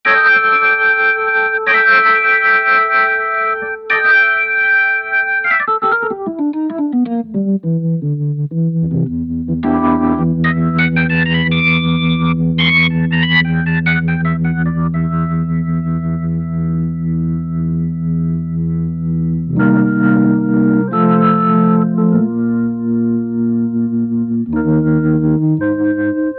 Distorted B

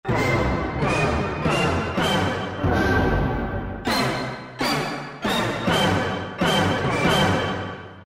Orch Hit; Sonic Reality Modern Songwriter

